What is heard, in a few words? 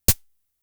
Tom, Toms; Fricke Schlagzwerg; Drum Machines